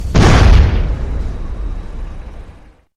explosion; san andreas

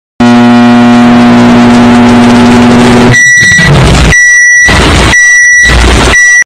microwave loud

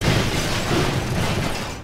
crash shatter; beetle adventure racing

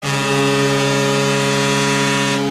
goal horn; vancouver canucks 2011 stanley cup playoff

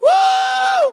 wooooo

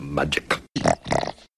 magic

